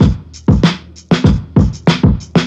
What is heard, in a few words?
hidden shaker, boombap drum loop; Drum loops